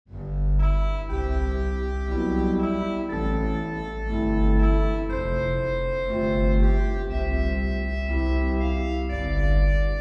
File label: OrganTeq Reeds